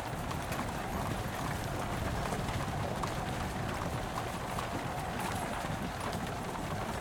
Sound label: reel Spin Freespin; excalibur